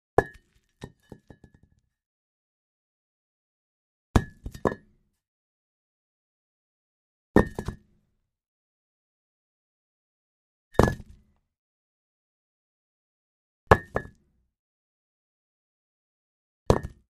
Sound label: Brick Drops X6